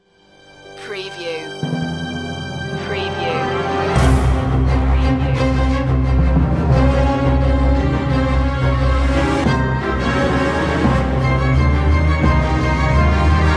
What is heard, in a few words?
orchestral action